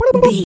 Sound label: voice fun character flutter man